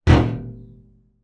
impact metal large thud drum barrel

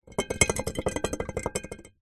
bottle glass 660ml full short wobble on concrete; foley; zapsplat

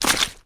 foley footsteps boots wet squelchy out of water

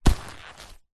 leather handbag or pouch grab

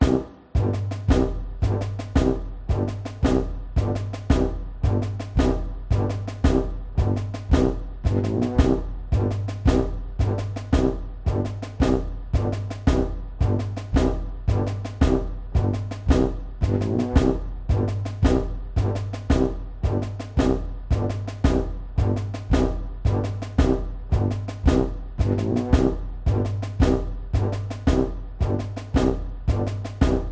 cartoon marching military band

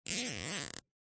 industrial zip tie short tighten clicks

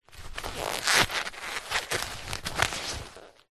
leather handbag or pouch handling creaks

